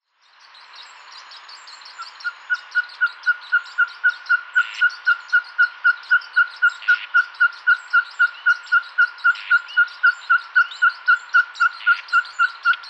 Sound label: Glaucidium nana, Magelhaendwerguil; uilen; Strigidae